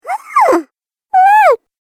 Cartoon Game Voice Shocked Sounds; Cartoon Voice Sound Effects

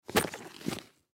Combat Boots Set Down Carpet; Shoes; Foley

